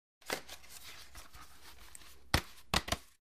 Biking Helmet On

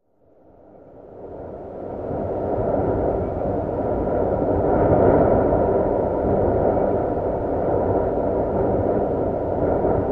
Medium Wind Gust Eerie

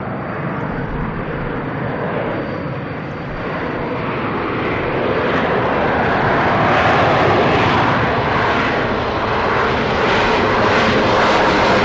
Harrier Vertical, av-8b harrier; C-Harrier Vertical Take Off

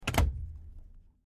Boat Throttle Movement

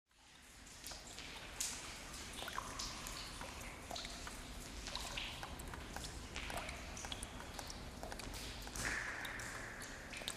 Wet Drippy Reverbera, drips

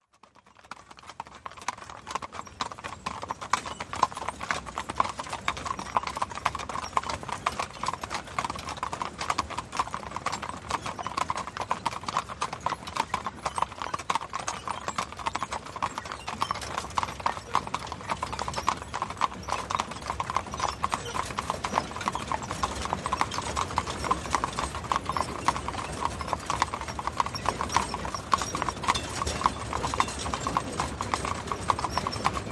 Caisson By Cu Away Dis, wagons

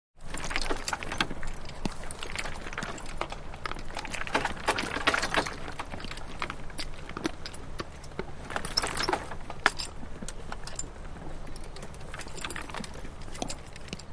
Carriage Idle, carriages

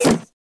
Space Drawer Servo, drawers

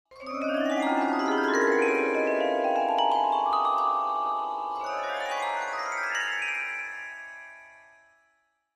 Vibraphone Magical, mallets vibraphone

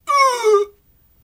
Grunt Male Single

